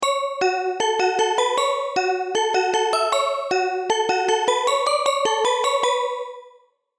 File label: F#m 150, Future Bass & Melodic Trap MIDI Melody; Molecule